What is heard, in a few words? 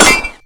hit, crossbow; weapons